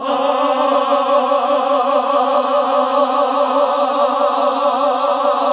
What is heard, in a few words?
GOSP. AAAHH